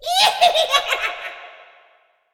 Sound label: witch slow